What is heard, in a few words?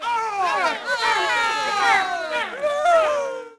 CROWD NEG LOW; Cars; XBOX